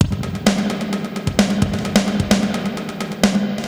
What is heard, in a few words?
Spring Verb HR-16B (130BPM), cyborg beats